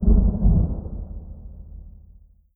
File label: dimensional horror portal thunder; Stellaris; guardians